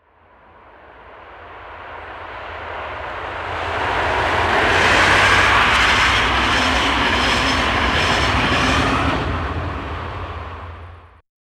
atmo; Techno Trance Essentials; FX & ATMO

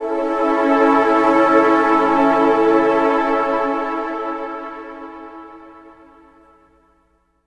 Orchestralic, Pads; Sonic Foundry (Sony Creative Software); Modular Electronica

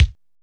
drum, drumcomputer, EMU SP 12

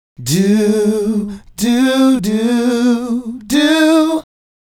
Male Group, Vocal

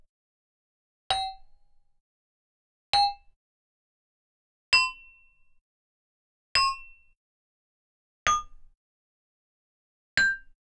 Gamelan; Propeller Island - Complete Gamelan

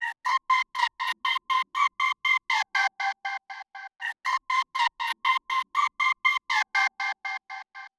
Stuttered Vox 120 BPM G min; Vocals; 99Sounds; Monosounds

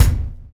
Circuit Kick; Sonic Foundry (Sony Creative Software); Drums & Drones; Construction Kits

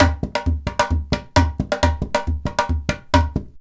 IJEILU GROOV; Best Service; World Colours

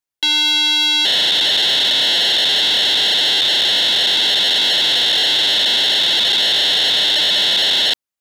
PO-32 data transfer sounds; Tonic Drums

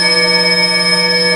F3 tube bells; Northstar; The Wizard VOL-1; FLUROBELLS

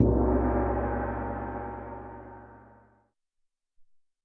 Gong

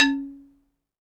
XYLOPHONE, Percussion; ILIO

